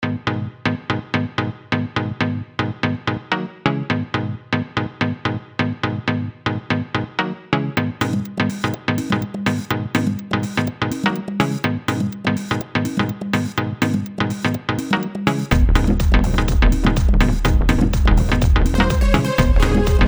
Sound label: Jingle; On Tv